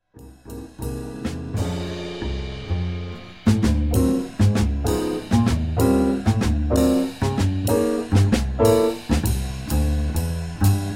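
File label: minus melody, trumpet solo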